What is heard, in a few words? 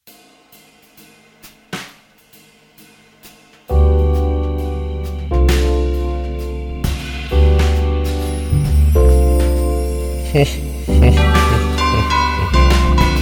jazz